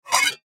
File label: Metal Bowl, Metal Bowl Grab From Stack Scrape; Dishware; Household; Blastwave